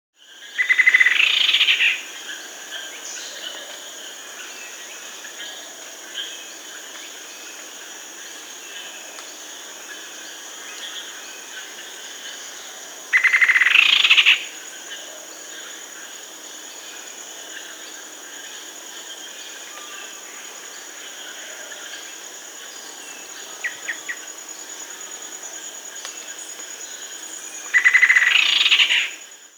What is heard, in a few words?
Rufous-Winged Antwren (Herpsilochmus Rufimarginatus); S. America; Andean Birds; Natures Songs